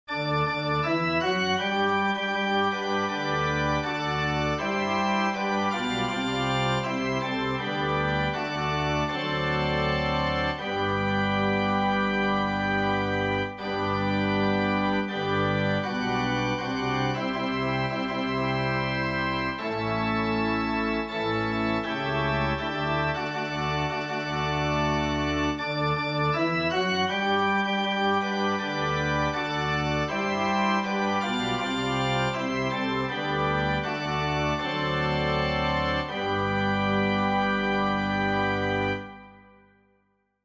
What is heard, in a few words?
go ye into all the world organ